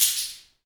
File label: metal jangle, perc fx; Roland LCDP14 Africa VOL-2